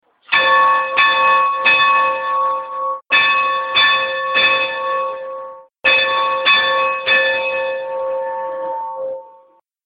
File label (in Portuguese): sino a voz de deus; cancao nova lavrinhas